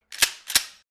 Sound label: Mossberg 590 pump series